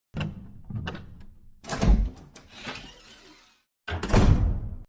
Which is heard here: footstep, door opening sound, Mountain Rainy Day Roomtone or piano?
door opening sound